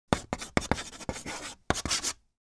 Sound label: Writing with chalk on a chalkboard